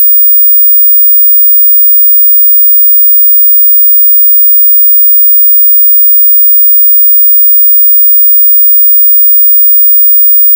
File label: 15kHz Sine Wave; Function generator